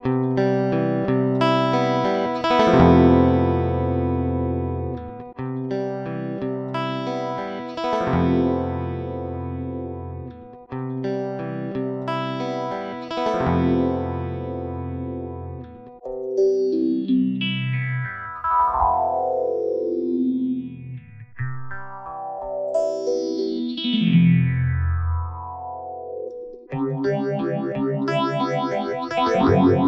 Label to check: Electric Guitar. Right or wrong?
Right